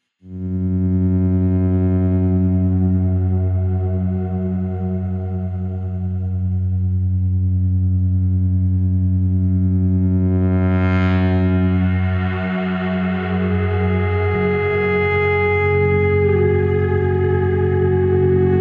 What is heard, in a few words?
eerie soundtrack